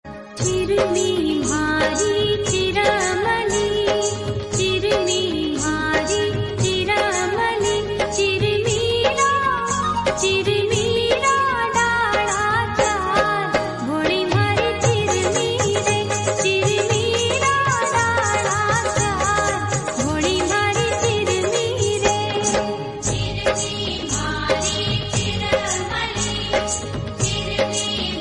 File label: Rajasthani Ringtone